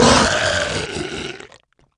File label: monster zombie warlord death; The Monster Plane; kolmods death